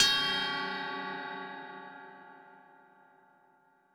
Ride Ring Lo

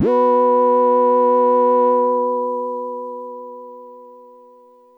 Synth, Soft tone

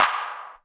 Clap Club Verb

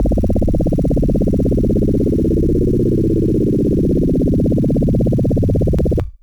Electric Bass DX100 C0

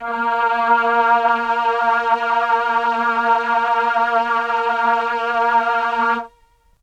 VP330 Combo Brite Vinyl Synths A#2; vinyl keys

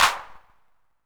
Clap 808 Color, Hand Clap

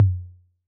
Tom Low C 808, Clean, Digital

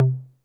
Tom Mid 808 Sat; Saturated

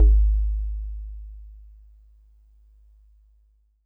Warble Celeste DX100 G#-1

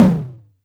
Tom High

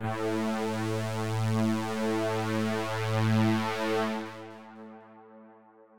analog A3; analog server

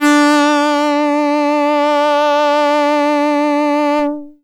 Box Cello DX100 D4

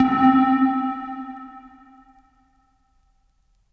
Lead2 Pop Verb Vinyl Synths C3; vinyl leads

